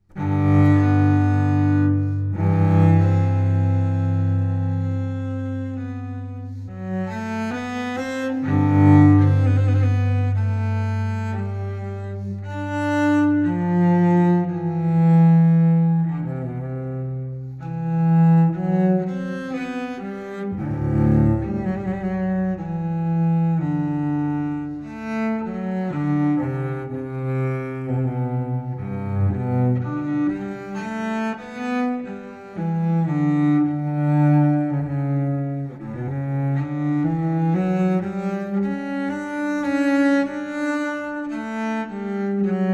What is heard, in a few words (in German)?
Sarabande G Dur Suite, Cello; Bach